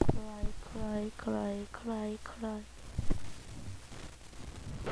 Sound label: cry